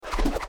menu Knife Throw

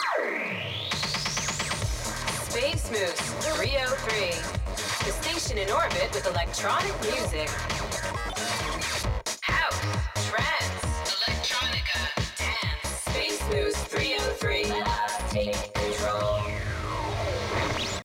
Radio Electro Jingle; Gangstar Miami Vindication; android; macos

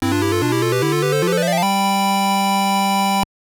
Jingle; Kanji Boy 3; gbc

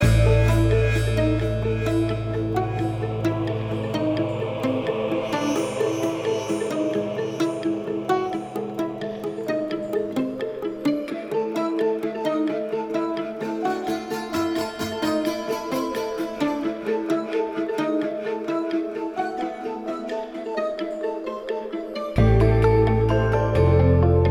Fire Elemental Gate; Skylanders Giants

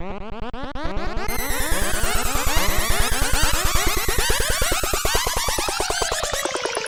Electro

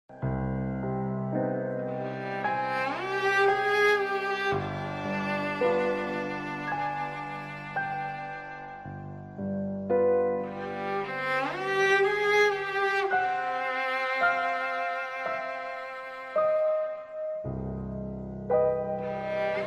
Mystery Ambiance (Cello); Alice: Madness Returns unreleased original soundtrack

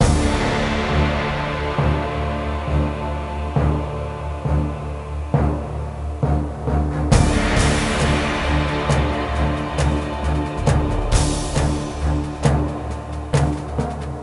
Wizard Battle; Lego Battles 2009 NDS

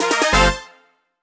Jingle Set End; clubhouse games 51 worldwide classics 2020 switch gamerip

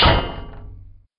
Clang; tempest 4000

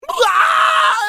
Pig fear; bad piggies; android; mobile; windows

